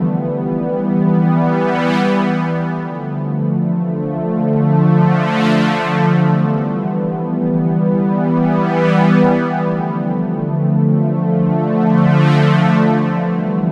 Pad, Synthesizers

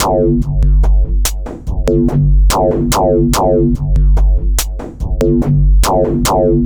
Electronica Drumloop; Drums

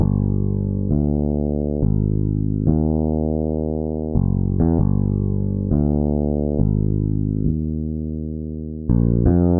Electric Bass; Basses

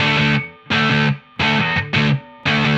Electric Guitar, Loops; Guitars

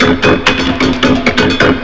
LOOP; SoundFactory; DRUMLOOP

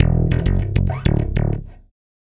Chapman Stick, Basses, Loops

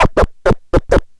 Turntables

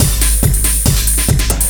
Dance Drumloop; Drums; Dance Beats

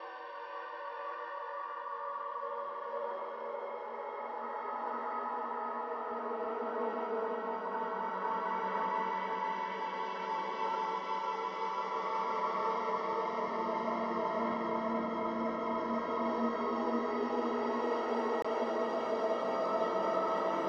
Soundscape; FX

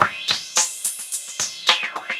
Old School Break, Drums

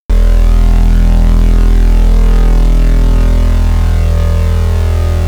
Organ Bass; pitchshifter PSI

